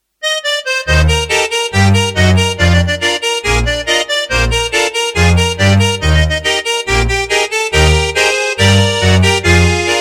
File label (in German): Polka